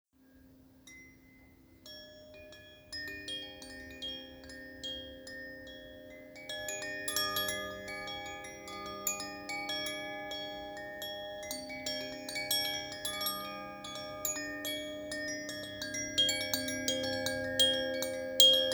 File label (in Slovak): 1 cakra, cakrova zvonkohra korenova cakra